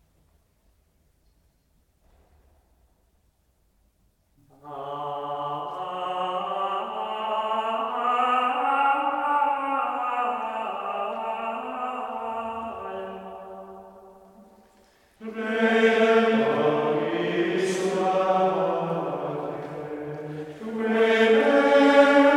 gregorian chant alma redemptoris mater antiphona tonus monasticus; Marian hymns